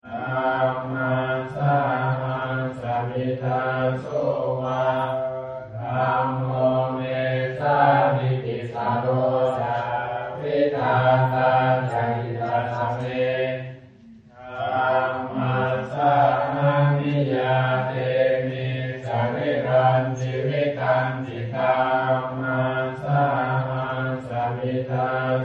Buddhist Monk Chant; Bell Sound Effects; Musical

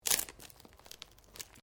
Branches Dead Snap

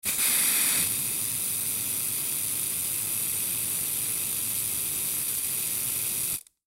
Hairspray Can Spray Long Harsh Fizz; Hair Salon Barber Household; Foley; Primping; Blastwave

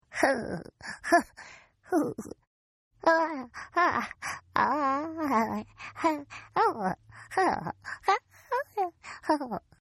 Baby Laugh; Laughter; Partners In Rhyme

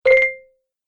Classic Bonus Points, video game sound effects

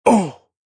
Oh Male, Male pain

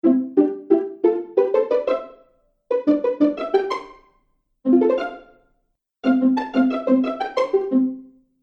Cartoon Strings Pizzicato

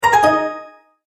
multimedia tone end

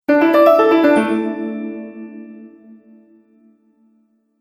Bright Melody Mobile App; Musical

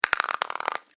Skeleton Xylophone; Evil Sound Effects; horror; Andrew Fleming

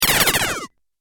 Science Fiction Laser Shooting, laser gun; Sci-Fi Laser Sound Effect

